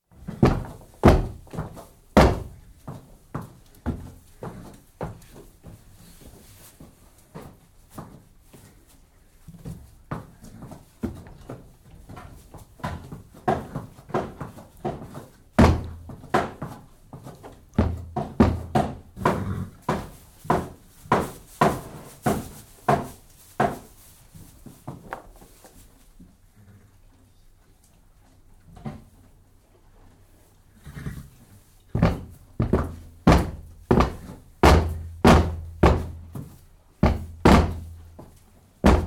Horse kicking stable door & stamping, others occasionally following suit & calling to each other; Horse Sound Effects; BBC